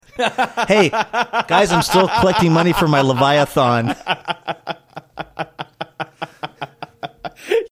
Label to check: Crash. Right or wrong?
Wrong